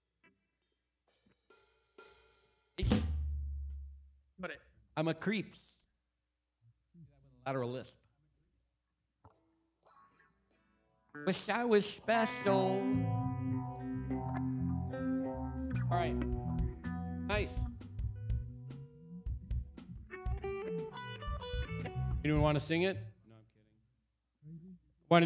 Vocal Emphasis Mix

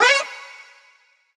vocal ignite C#; SD AURORA Sample Pack; Slate

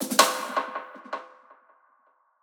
snare oneshot; SD PASADENA Pop Sample Pack; Slate

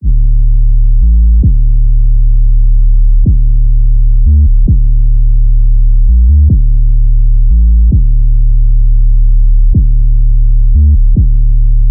808 loop 148 Dm; Slate Digital BASSHOLE Sample Pack; 808 Loops

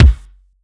Kick, 909; Slate; Vintage Drum Machines Volume 3 909 Edition

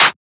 clap noise; Drum Sample Pack; One Shots; Claps; Slate; SEISMIC

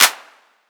Clap, 909; Slate; Vintage Drum Machines Volume 3 909 Edition

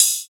hat copper, Open Hat, Drum One Shots; SD MIDNIGHT Sample Pack; Slate

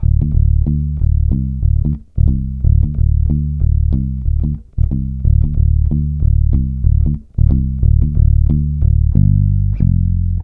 Bass loop 92 Dm, Electric Bass Loops Direct; Slate Digital BASSHOLE Sample Pack

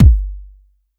Kick, 909 Kicks

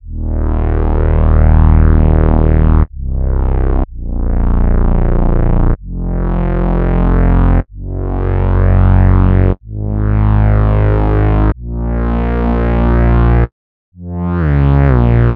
Synth loop 125 Bbm, Synth Bass Loops; Slate Digital BASSHOLE Sample Pack

